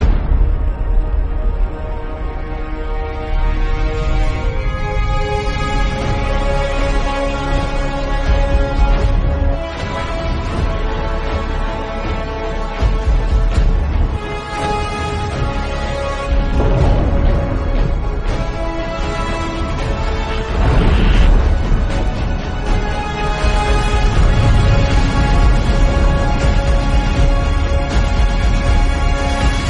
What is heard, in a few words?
background music; oppenheimer